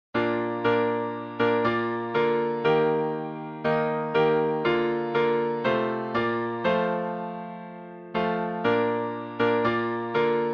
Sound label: Hymns